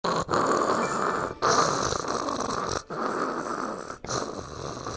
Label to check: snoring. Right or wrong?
Right